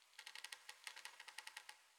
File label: wood strain, Night; FontainebleauDemo